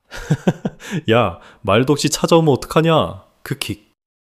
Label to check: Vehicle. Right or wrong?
Wrong